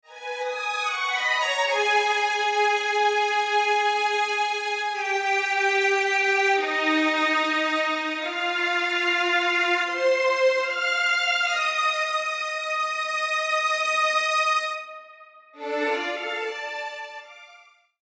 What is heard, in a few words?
Strings Dual